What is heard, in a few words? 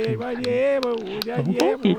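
Music Village Calm Inside; from dust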